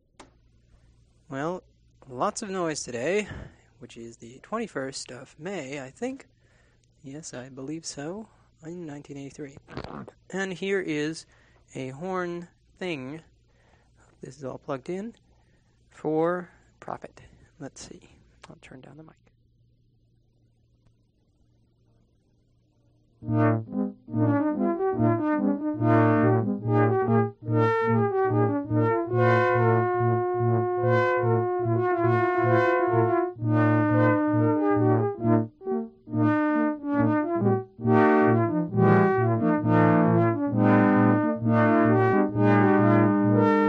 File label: Horn Thing P600 Horn